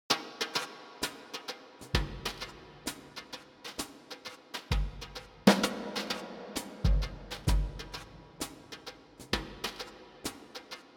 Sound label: SuperPlate Drums E Plate III Clean Mix50 Decay 4s